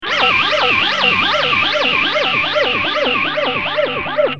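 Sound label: guitar, final phase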